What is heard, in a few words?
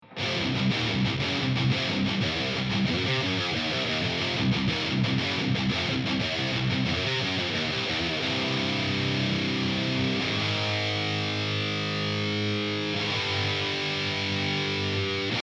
vht pitbull; huge amp shootout